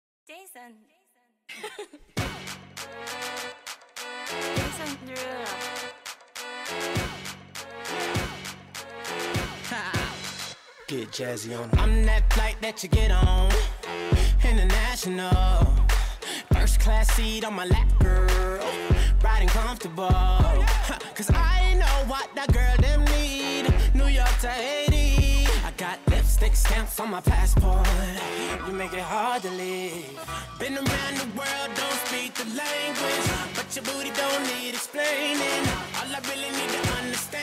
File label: MUSIC SOLO HIP HOP